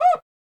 drum cuica

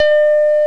Macintosh 128k Startup, chimes

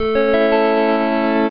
Macintosh II Death, chimes

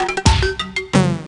Macintosh Quadra AV Death

